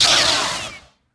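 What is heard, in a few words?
lightsaber hit high; weapons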